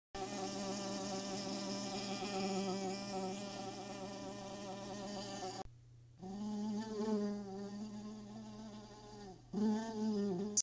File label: bee